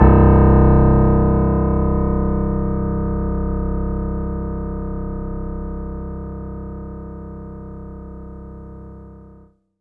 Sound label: C1, synth; 90 s Sample CDs; NiceBeats; SynthLine VOL-23